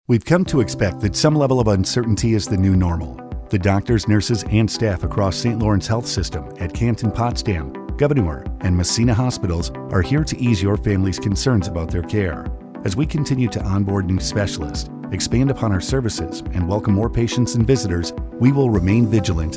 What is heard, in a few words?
music